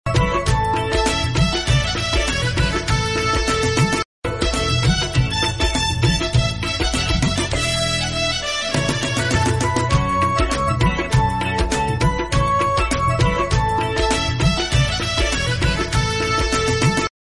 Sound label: Pakistan National Anthem Music Only Ringtone